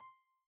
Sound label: FITS Received; KStars